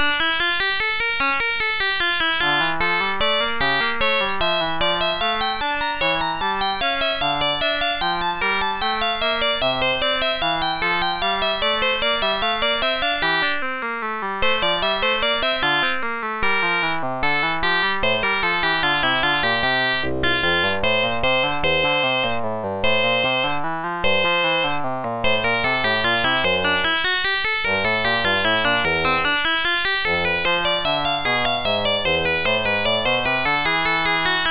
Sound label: invention